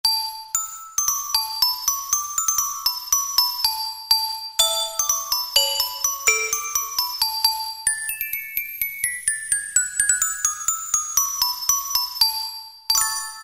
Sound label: Glocken; Roland SR-JV80-02 Orchestral